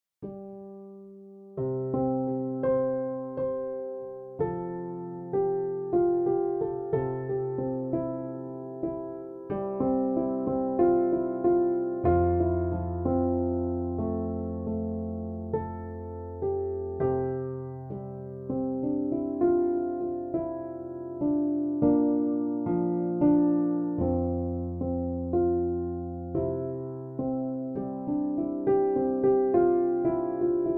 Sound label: Bechstein Sweet Version